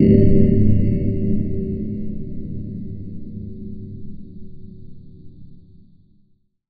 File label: big clank